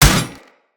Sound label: Hammer Impact Armor Damage; Weapon Impacts; Player; Combat; Tuvalkane Hammer